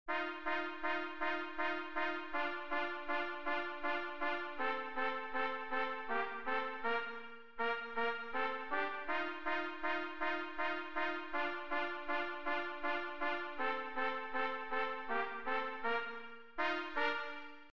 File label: Chopsticks